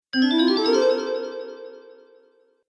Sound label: Slow Cartoon Magic